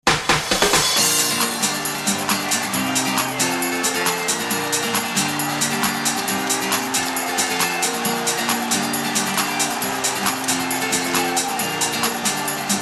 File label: song; ffn in Tiftlingerode